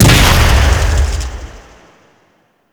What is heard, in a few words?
Fist Ground Slam; Warframe; Mummy